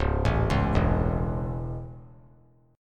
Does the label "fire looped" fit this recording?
No